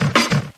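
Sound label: percussion, Kick Snare Drum loops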